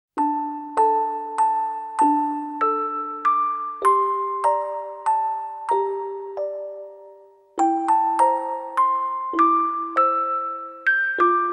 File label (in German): Orgel; MusicBox; Garnet Guardian; SailorMoon